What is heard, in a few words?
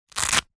foley wallet open velcro fast; zapsplat